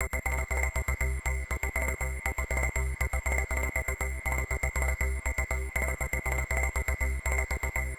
Arp; Keystage

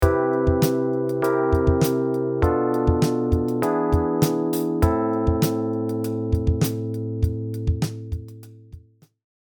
Cmaj7 C7 Bm7b5 E7 Am

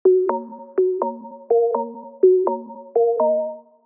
synth Dry

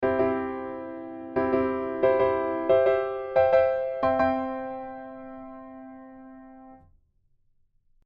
c maj c

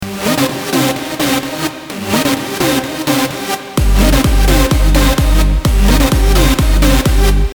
only rev

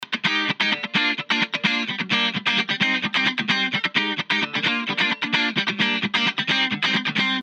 Guitar Clean

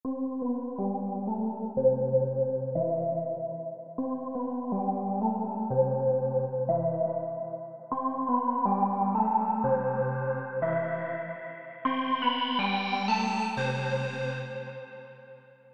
Filter Up

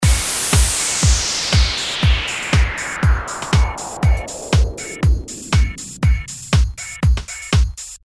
synth

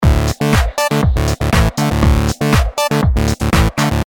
synty 5th

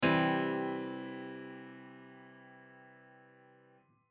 high piano

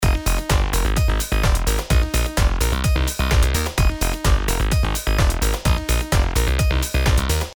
bass

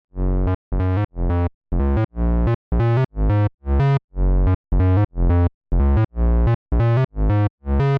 filter; tal

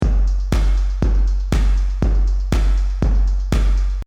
drum

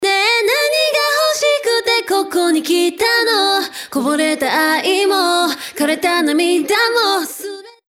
reverb hamori

